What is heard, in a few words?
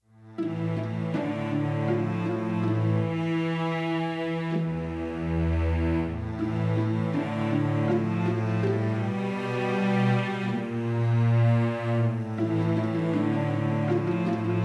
TENSION; ChocQueHangRao